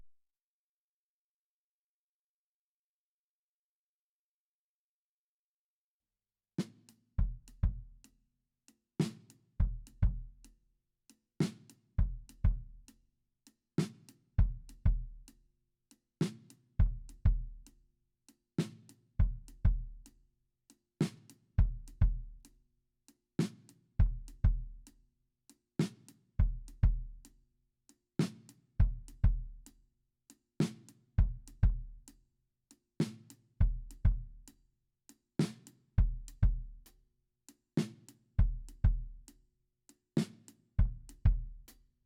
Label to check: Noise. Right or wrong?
Wrong